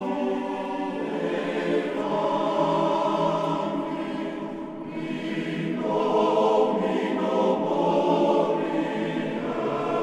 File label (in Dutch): Koor mannen; Mastreechter Staar